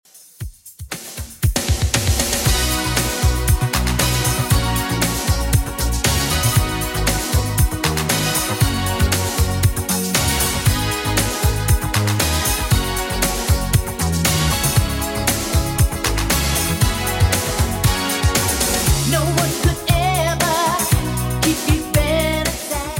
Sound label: 80s Redrum 117bpm